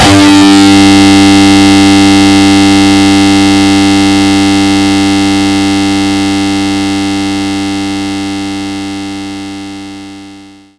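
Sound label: Distortion Guitar Low G2; knights try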